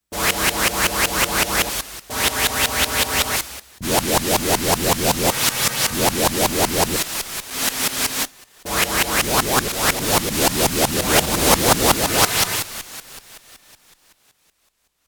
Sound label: Space BUG, Yamaha DX11; Factory Preset Voices